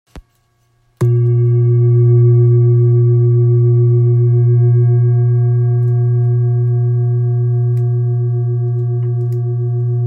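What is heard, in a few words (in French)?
Bol grave bouddha la dièse 2.455kg, Bol chantant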